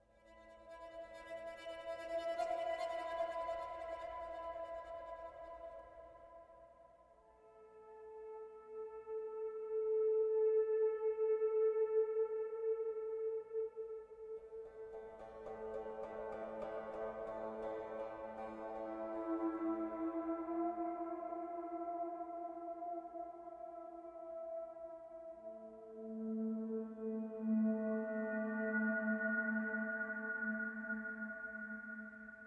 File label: City Ambience; Assassin's Creed III